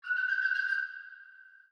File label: ambient tropics birds